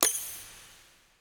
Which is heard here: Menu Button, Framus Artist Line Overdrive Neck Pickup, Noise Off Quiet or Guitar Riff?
Menu Button